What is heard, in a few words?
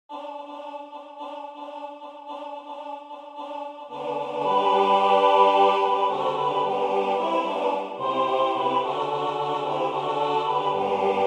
Siyahamba African Song; 4 voix; 2 mesures ‘rythmiques’ avant l’entrée de votre voix